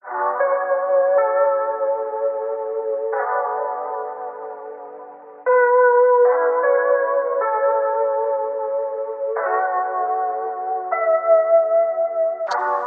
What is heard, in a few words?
chill